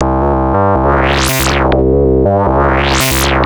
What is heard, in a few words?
Analoop, Industrial Analog